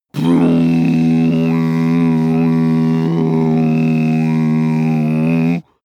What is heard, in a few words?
male bass hum hard; koerpergeraeusche; menschen; soundpool